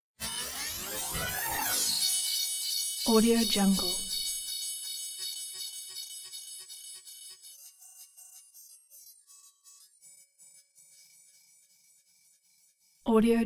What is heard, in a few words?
energy sentinel surveillance active; robotic lifeforms 2